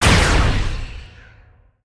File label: Space Ranger Laser